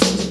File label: SD, dance drums hip